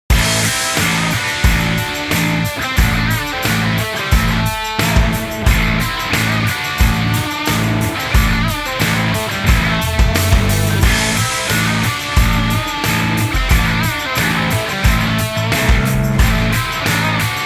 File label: Rock; farming simulator 17 nintendo switch edition; Smackdown